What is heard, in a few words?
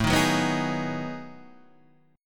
B 13th A, chord